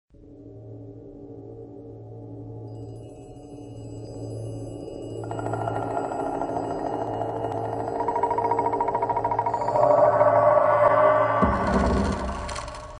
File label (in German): atmosphere akzent